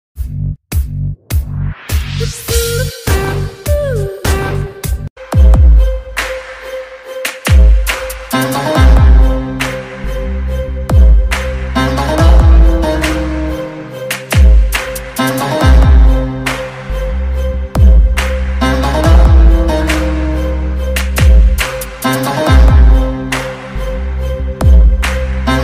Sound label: attitude ringtone, south indian bgm ringtone; marshal bgm